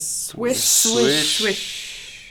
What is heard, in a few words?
Fish Sounds